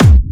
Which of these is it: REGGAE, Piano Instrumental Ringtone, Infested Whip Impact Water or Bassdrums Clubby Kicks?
Bassdrums Clubby Kicks